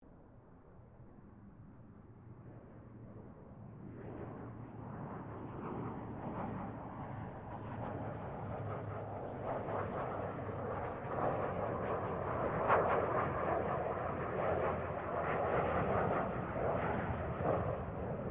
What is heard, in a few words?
AIRCRAFT GLOSTER METEOR F MK8 PASS DISTANT; Gloster Meteor figter jet; Airplanes